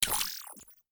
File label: photo collect